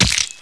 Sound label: squish; Dink Smallwood HD